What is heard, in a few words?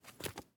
Face Mask, Medical; Blastwave